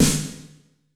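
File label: MIRROR Snare; Masterbits - TR-Minator; 909 GM KITS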